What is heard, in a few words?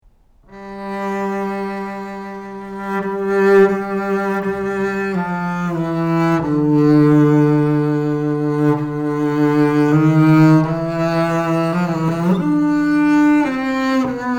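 antique double bass sound sample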